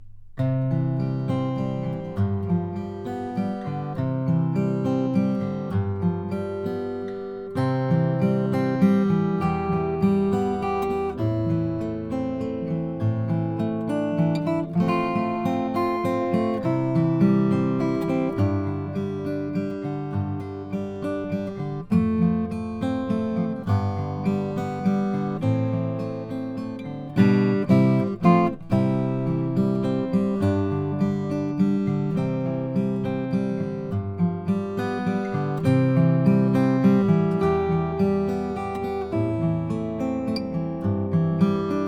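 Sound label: froggy bottom a 12 deluxe german spruce top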